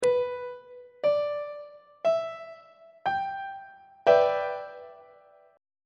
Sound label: g major 6th 1st inversion on treble clef